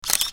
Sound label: camera shutter; immersive-home